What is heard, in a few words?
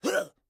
Water Splasher; rabbids multiverse; iOS, macOS, Mobile